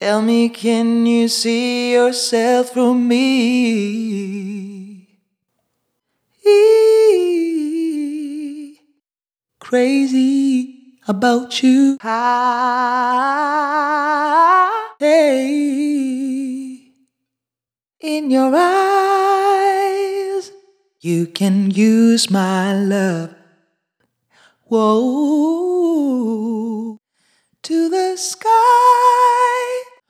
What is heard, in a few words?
chill vocals